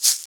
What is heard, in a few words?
Egg, shakers